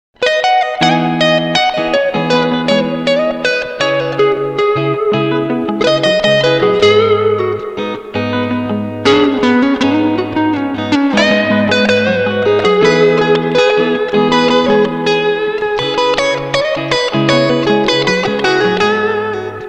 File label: Chilled Guitar and Sax